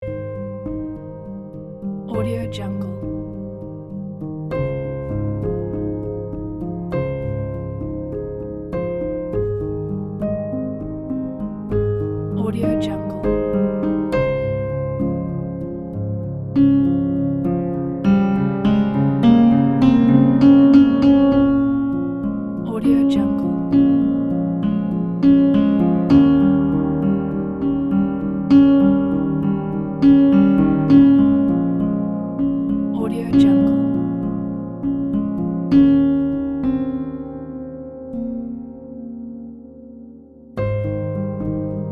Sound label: cinematic romantic piano